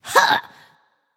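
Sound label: grunt attack, female; smite; voicepack; hera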